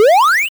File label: 8 Bit Jump